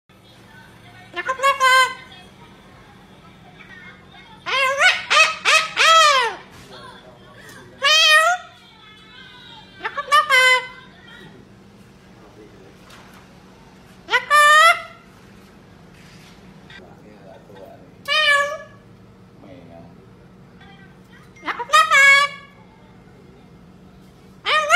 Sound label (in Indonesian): suara burung kakak tua merah